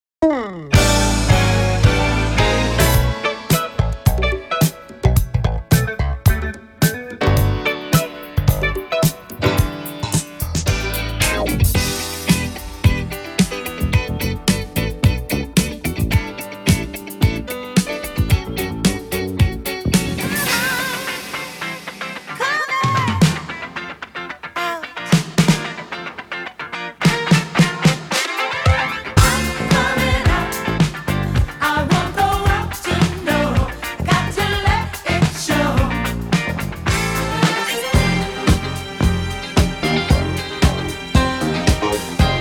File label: Funk Mix